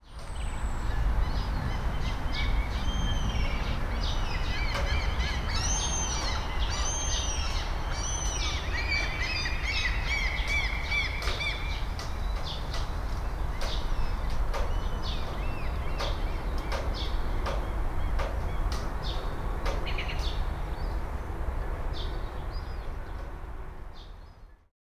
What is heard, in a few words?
seagulls cry